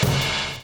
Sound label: DOWN, DOWN KIT 78